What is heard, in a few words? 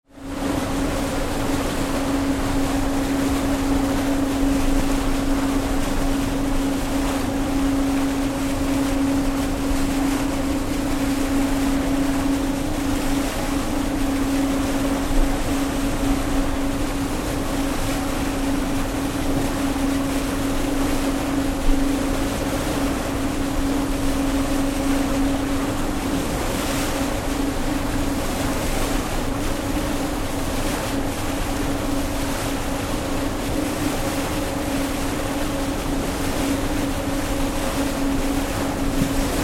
Ship Large Ferry Bow Wash; Tom Hutchings